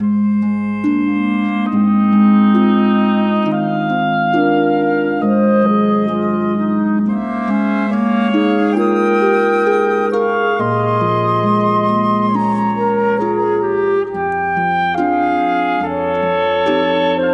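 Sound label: Bedroom Ambience; Disney's Cinderella's Dollhouse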